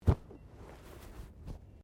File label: Body movement Fall Slide Light; Body movement foley to simulate falls and deaths; Wrestling And Struggling